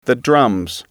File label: drums